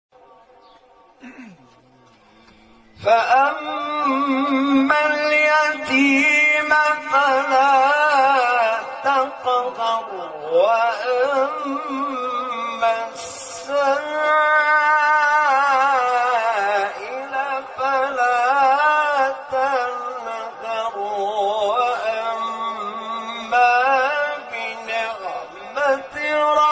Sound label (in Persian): فرازی از سوره; ضحی; استاد نعینع